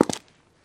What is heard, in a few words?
footstep concrete